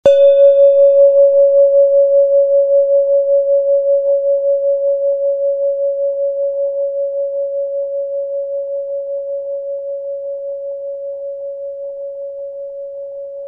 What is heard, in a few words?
cosmic tube pluto